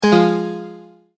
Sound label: multimedia tone error